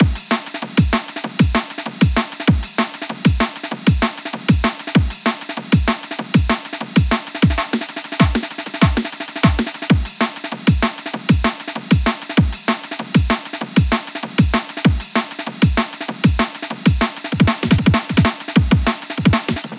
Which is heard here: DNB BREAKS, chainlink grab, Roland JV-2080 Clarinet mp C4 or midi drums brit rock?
DNB BREAKS